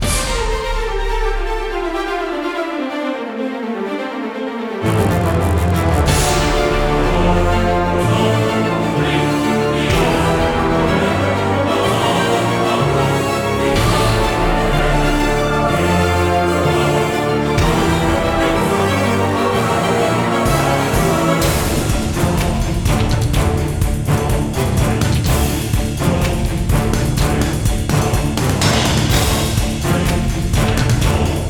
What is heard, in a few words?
loop music birds; Hercules